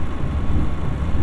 vent; Mafie 1

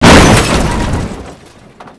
crash; Mafie 1

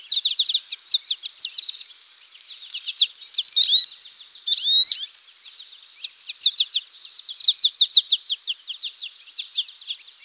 bird; Mafie 1

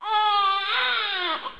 baby; Mafie 1

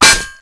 metal; Mafie 1

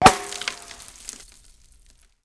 wall; Mafie 1

